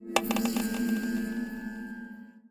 Bridge Collapse; Movie - Ad; Christmas Stories Nutcracker

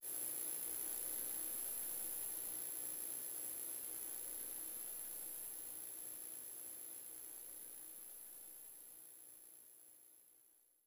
Ambient Microbes; Afterzoom; DSiWare; NDS

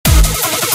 Dubstep

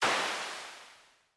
Cloud Puff; Boomerang Fu

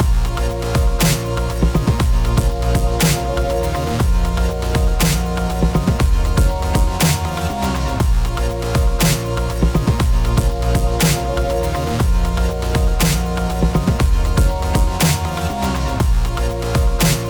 Dialogue Theme

